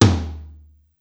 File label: Tom Low, Korg Wavestation-SR, Drum Kit; Wave 455 - Drum Kit